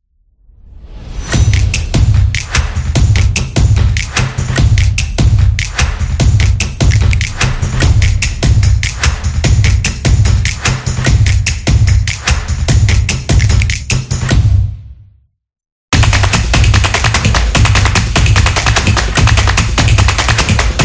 Drum Upbeat Beat

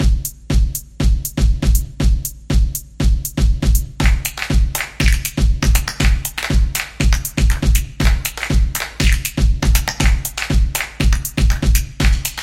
Infomercial

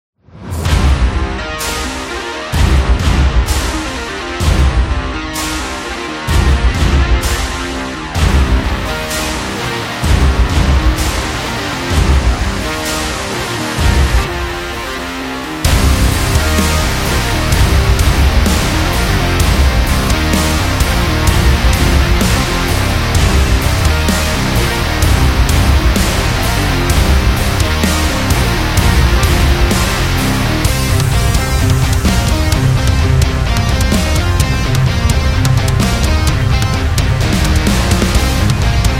Stadium Rock

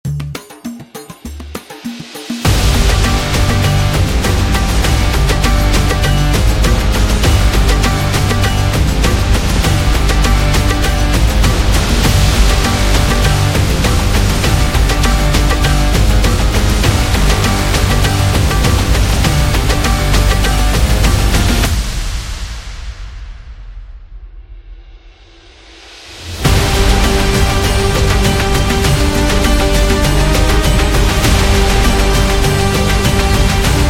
Arabic Trailer

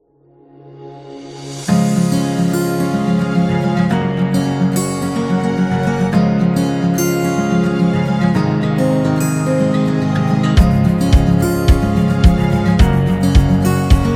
Upbeat Acoustic Guitar

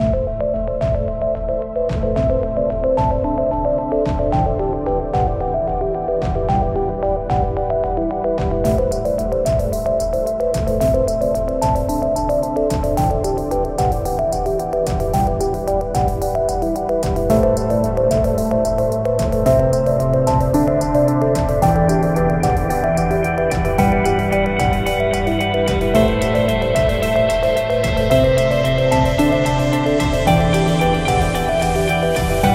Future Corporate Technology

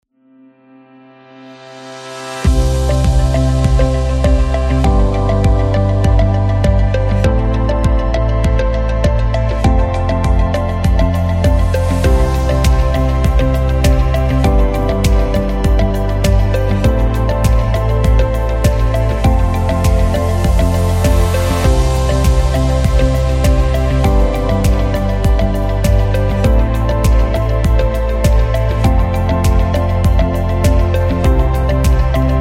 Instructional

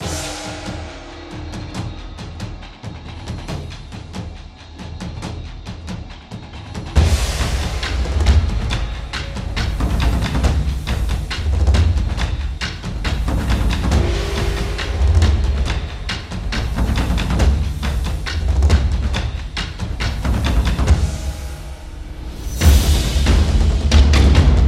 Japanese Drums

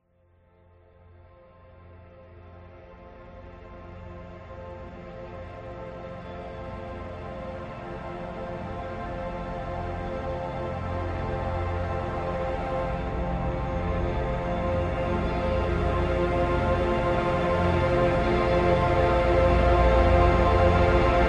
Meditation Ambient

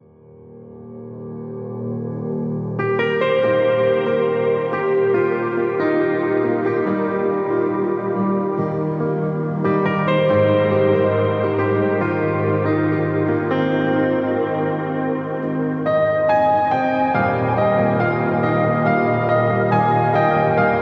Piano

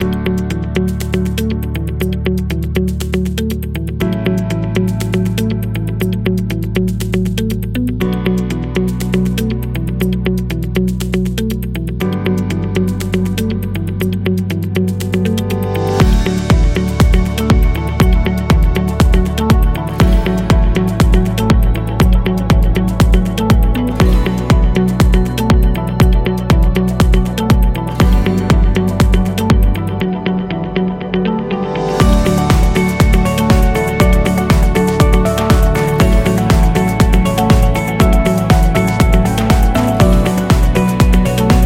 Corporate Background

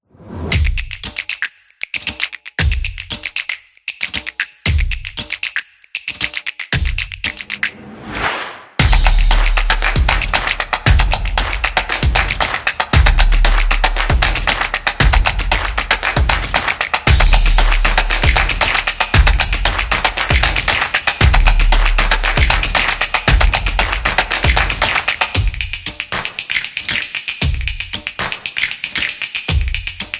Snap Fingers

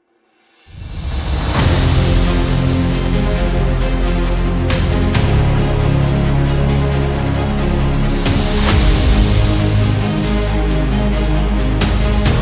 Epic Dramatic Trailer

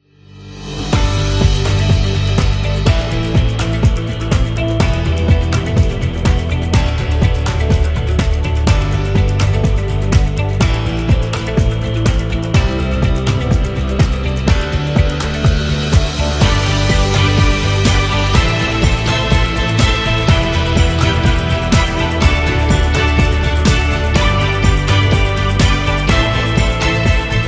Motivation; service business

